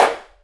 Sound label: Snare Bandroom, drums